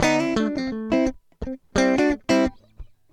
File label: sweet, loops guitarra; loopasonic